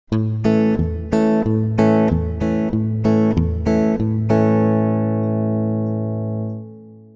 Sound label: Country Tele A Chord; KENROW